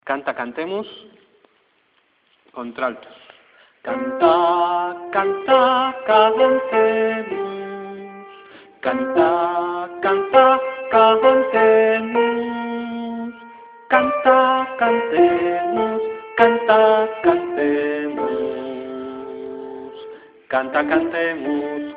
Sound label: alto